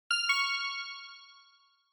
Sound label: Quiz Ding Dong Slow Single